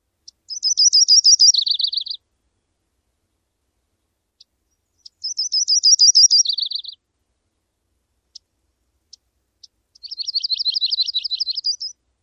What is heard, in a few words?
Myrtle song